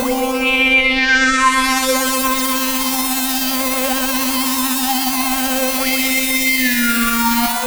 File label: E Sitar, dance electro